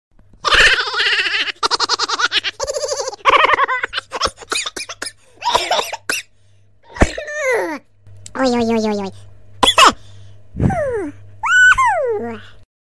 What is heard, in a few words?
cartoon laugh ringtone